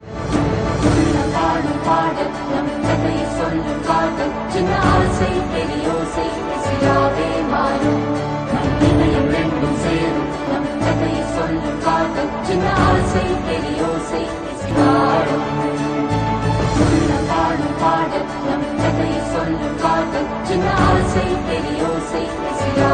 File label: Wedding Tamil